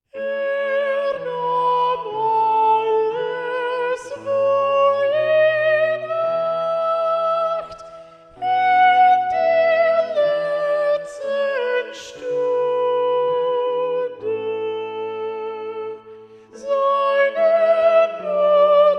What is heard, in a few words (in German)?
Er nahm alles Soprano